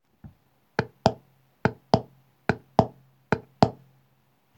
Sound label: rhythm